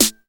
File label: nice snare drum A key; Duration 0:01; Samplerate 44100 Hz